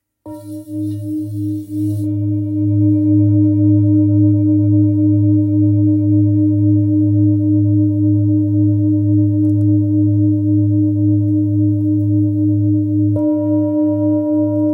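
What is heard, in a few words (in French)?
Bol Bengale 2.1-2.2kg ré dièse, Bol chantant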